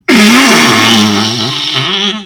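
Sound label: derevenskii perdezh, village fart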